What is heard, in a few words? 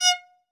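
solo violin, strings; ILIO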